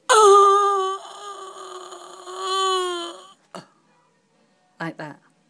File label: primal groan; smashed toe